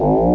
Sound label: electromagnetic